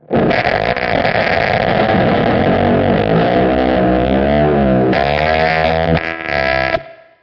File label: no whammys here, guitar noizes